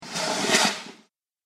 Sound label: Fireworks Rocket Whoosh; Fireworks - Rocket Whoosh And Bangs With Cheers; Firework Sound Effects; Celebration, Party; Andrew Fleming